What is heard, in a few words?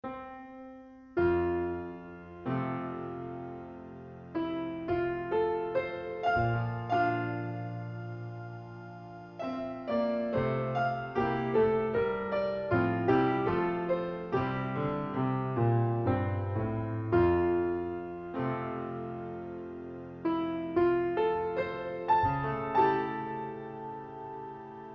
Sync Wavetable Soni